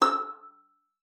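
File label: violin pizzicato E5; Xsample VOL-5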